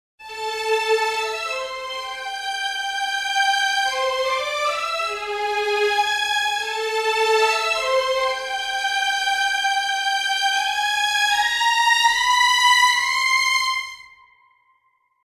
orchestration violins sus, violin melody with just the first patch; Hear our violin melody with just the first patch; epic orchestral track